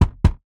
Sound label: knock